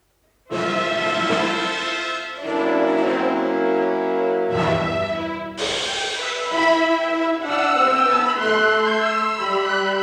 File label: Music Example (Orchestra); Deryck Cooke; Illustrated talk, Symphony No.10